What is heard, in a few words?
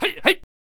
Spear Thrower Attack Vocals